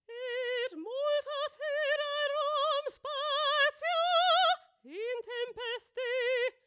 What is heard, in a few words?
choir